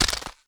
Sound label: Falling platform crumble; Blastmorph Pinball; xbox series xs